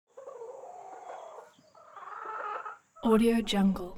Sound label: animal chicken hens clucking